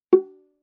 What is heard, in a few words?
Viola pizz ff sul C Gb4; MIS Pitches - 2014; Strings